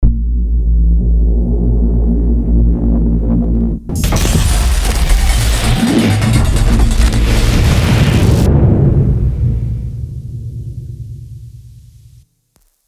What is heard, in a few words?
spewing shrapnel sound design; score a podcast documentary by Bellingcat